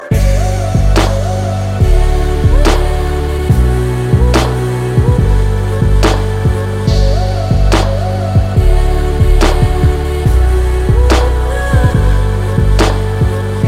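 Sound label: Text Sound Instrumental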